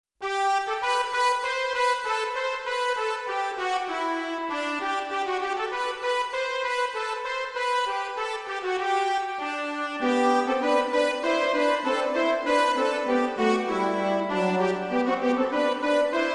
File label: Horses Branle